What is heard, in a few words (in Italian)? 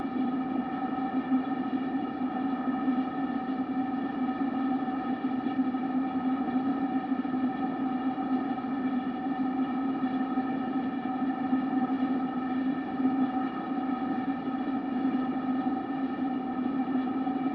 Rumore Fondo; CESI; LaSpezia